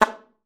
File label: A3, alto sax; Xsample VOL-2